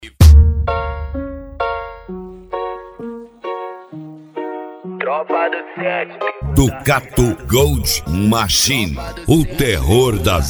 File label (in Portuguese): especial funk